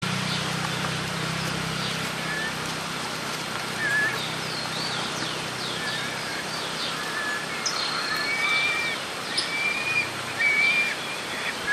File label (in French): buse roussatre